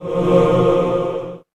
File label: magic holy; wesnoth